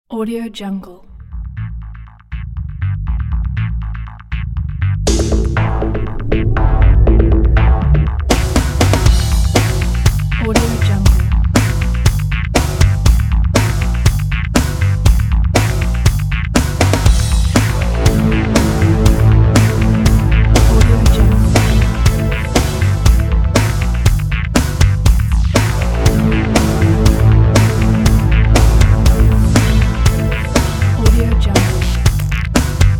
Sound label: enemy unit approaching